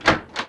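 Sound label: door open; The Sum of All Fears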